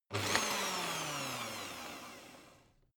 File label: electric whisk rev; haus-garten; kueche